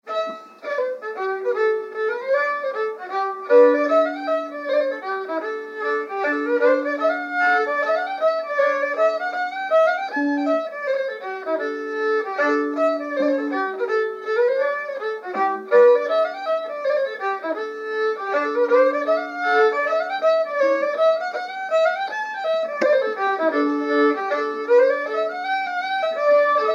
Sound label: hornpipe; Poll Hapenny